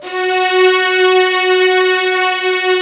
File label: STRS F#3